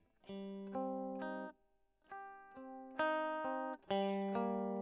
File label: E.GTR Gefell